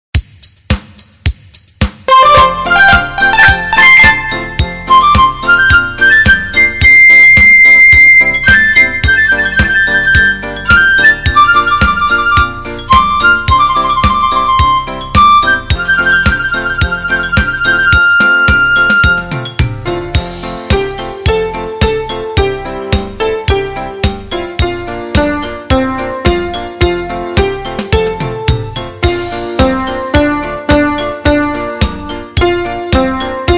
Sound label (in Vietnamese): beat